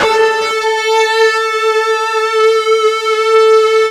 FUZZ A 3, ULTRA FUZZ, Guitar; East West; Steve Stevens Guitar Samples Collection

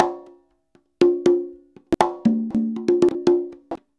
CONGA 119

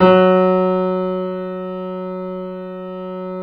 F#, The Piano; InVision Interactive - The Piano

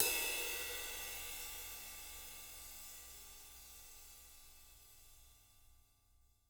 rides

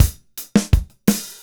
167 DRUM, Mediteranean Traditions; Soundscan vol.53; AKAI

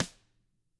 STK, TAMA SNRD, Drums; ILIO; Double Platinum Drums 2

